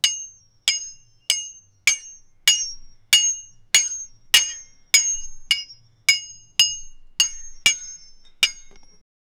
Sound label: mining many pickaxes swin